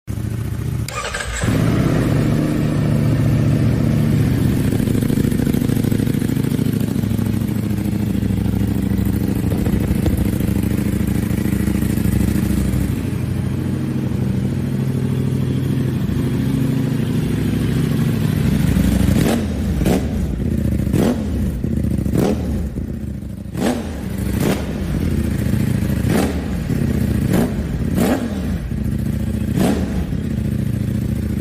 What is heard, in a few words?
Ducati street Fighter v4